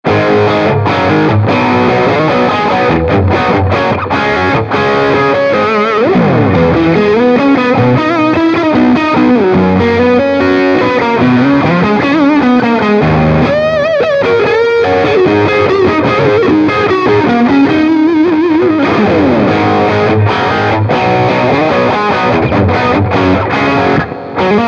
Fender Road Worn 60s Strat T Rex Magnus OD Dist Dly Rev Fender Deluxe Reverb